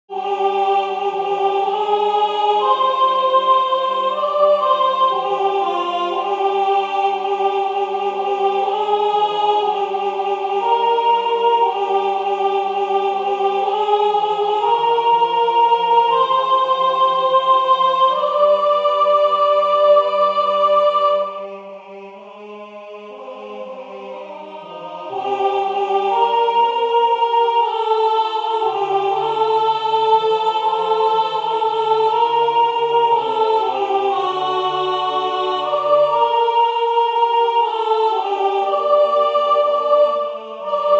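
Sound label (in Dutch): Soprano, koor; Wie der Hirsch schreiet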